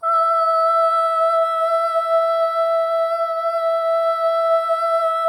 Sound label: SOLO BOY, OH; Spectrasonics; Symphony of Voices